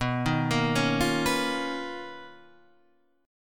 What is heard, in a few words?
B Major Sharp 11th, Guitar, Standard; BM#11; arpeggiated; JGuitar